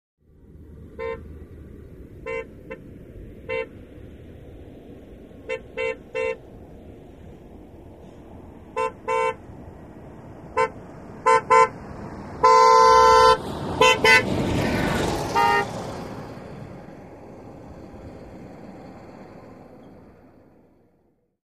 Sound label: Bus By Coach Approach